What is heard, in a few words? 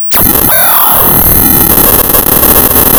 pop, tearing paper